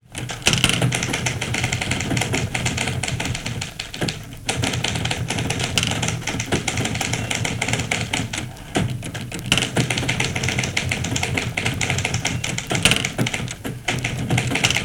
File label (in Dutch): typles